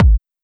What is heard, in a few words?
drum hit normal